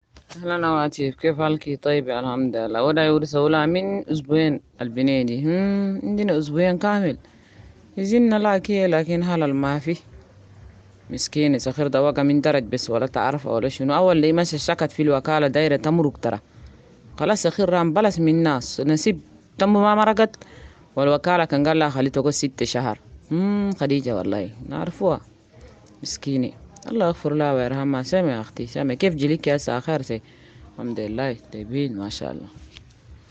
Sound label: Vocal